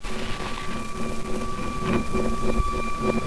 loop; hl1mp; valve

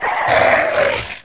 zombie alert; XDM

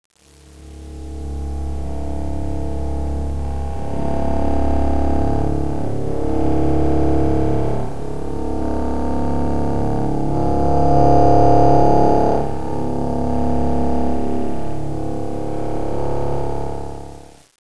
raspy; hl1mp; techno

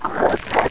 zombie idle; XDM

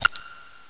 button click; hl1mp; XDM

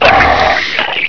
zombie attack; XDM

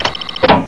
button; XDM; buttons

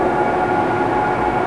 tunnel; XDM; ambience

